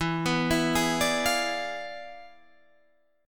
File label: E 7th Flat 9th Guitar Standard, chord; E7b9; arpeggiated